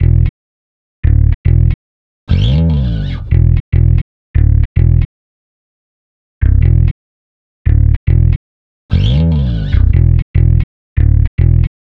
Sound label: Bass Slide; Sonic Foundry (Sony Creative Software); Club Joints and Street Anthems